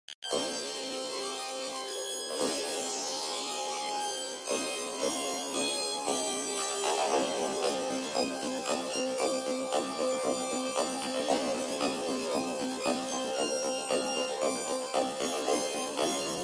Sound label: theme pitch shift; nfl